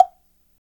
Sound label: SLIT, WOOD; Spectrasonics; Heart of Africa 1; AF.WOOD